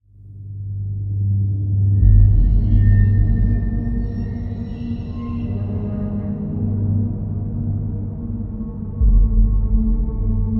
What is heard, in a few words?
ambient music; dnf ost